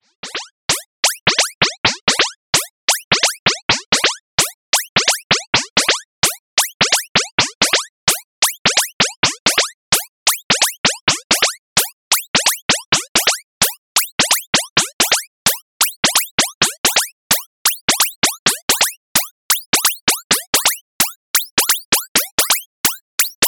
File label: ALM Busy Circuits Motto Akemie Ratio Sequence Speed CTRL FM Mode